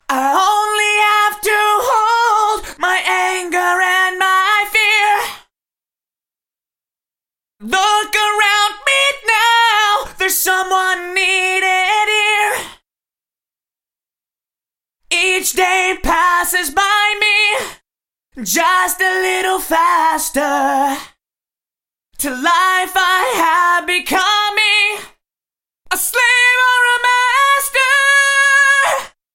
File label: Eventide H910 Vox Vocal Doubler